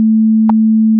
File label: sine pulse